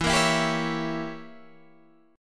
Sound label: E Diminished 7th Guitar Standard; Edim7 strummed; 12,13,14,12,14,12; JGuitar